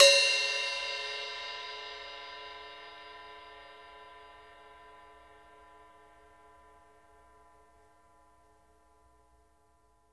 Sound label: STICK ON, HI-HATS; Sampleheads; New York City Drumworks VOL-1